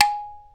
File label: PERC, Dance Mega Drums; Best Service; PERC MIX